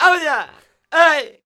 Flamenco, Vocals, Male

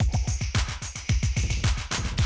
110, Classic Drum Loops

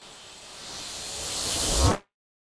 Reverse Fx; musicradar

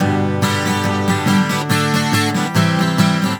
GTR, Celtic Flavours; USB Soundscan vol.42; AKAI

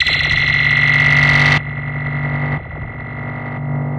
SFX, Trance NRG

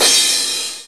CYM, Dance Drums

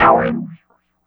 clav chord lo; Sonic Foundry (Sony Creative Software); Synchro Funk; One Shot Sonics

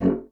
bassoon F#1; Xsample VOL-6; C BASSOON

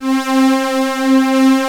OB, OB modular; Greytsounds VOL-1

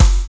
CRAZY KICK; Drums In Your FACE!; BONUSKIK

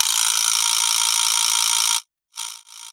Yamaha CS6, Drums

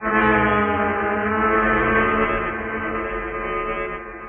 Horn Drone, Woodwind

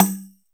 drumborine; ETH. PERC; Drums In Your FACE!

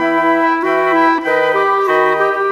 Winds, Orchestral, Rock Pop; Sonic Foundry (Sony Creative Software); Orchestral Series VOL-4; Rock and Pop; Rock-Pop 04

